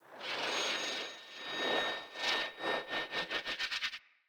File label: Throwing Knives Orbital Projectile Start